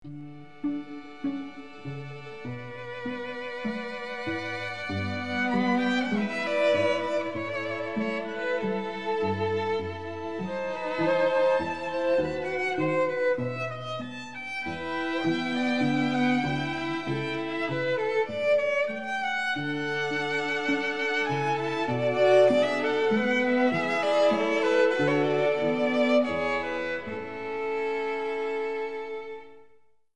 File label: Classical; Mall Tycoon 2